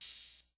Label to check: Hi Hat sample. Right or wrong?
Right